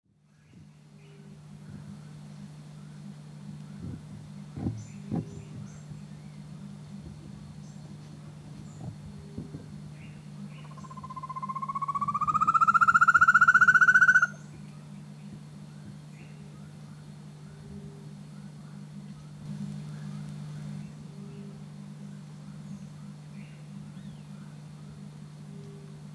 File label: Chamaeza ruficauda; Morro Agudo; SA Pinhal; SP; Silveira